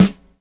natural snare single hit g key, Royality free snare single hit tuned to the G note. Loudest frequency: 1534Hz